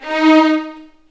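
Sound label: D#4 violins; The Ultimate String Collection; East West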